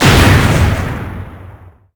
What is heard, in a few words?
explosion mix; sonic